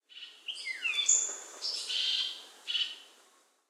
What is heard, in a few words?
bird; temple of nudges